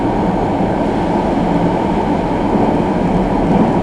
Run, Train; OpenBVE

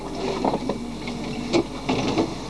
Door Open, Train; TW6000

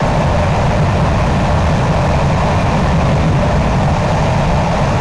run, Train; OpenBVE; 55022 Royal Scots Grey

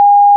BIP TVM, TGV; OpenBVE; Train; Orange

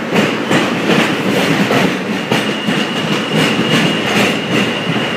Point, Train; OpenBVE

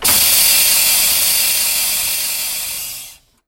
Air, Train; R62 Kawasaki 2020; OpenBVE

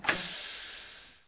switch wiper, class 66 train; OpenBVE

